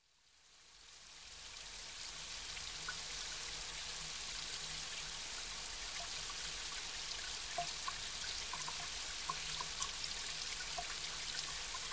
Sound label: Light Rain On Pavement; Sound Ideas; Series 2000